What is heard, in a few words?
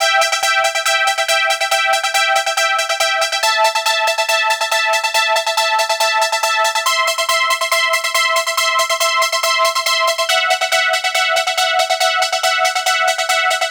Trance Synth